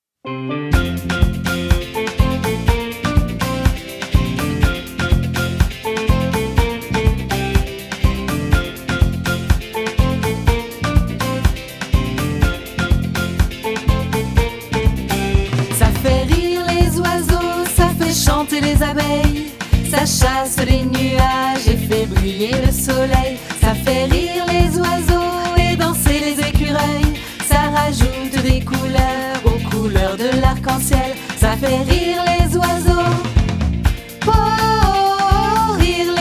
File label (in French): chant 1 et 2